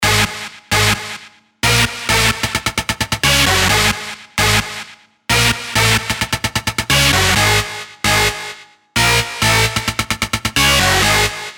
Stab Epic, SERUM

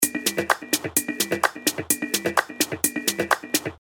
128 BPM; Chus

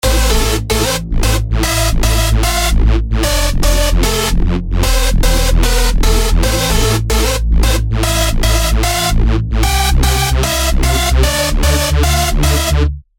Hardstyle Melodies A DRY (150 BPM)

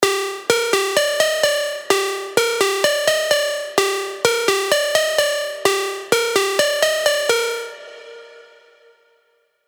Gmin (128 BPM), prog arps

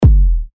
Kick F#; techno; Thrill

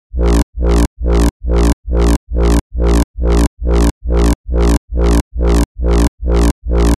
Reverse Bass Loop A# (138 BPM)

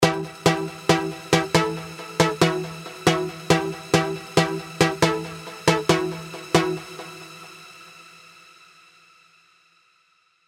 Arp; Matriarch; Serum

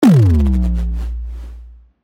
Impact; SERUM FX

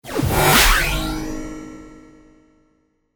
Machete; SERUM FX

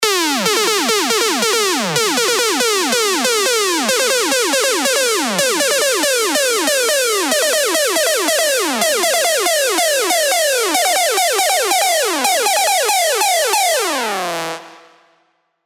Tonal Risers (140 BPM) 8bar B

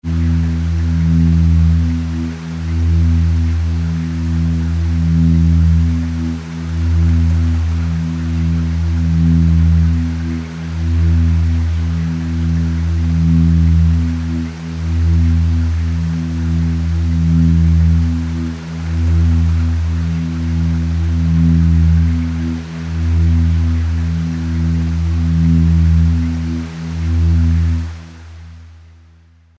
Atmosphere (Em 130 BPM); FUTURE TECH KITS

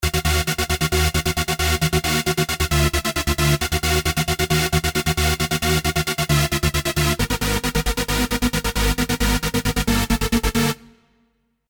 Stab Grandma's Tape

